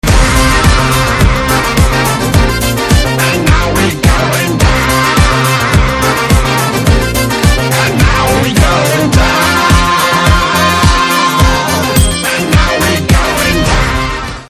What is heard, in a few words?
Trailer BG Instrumental Tone; De De Pyaar De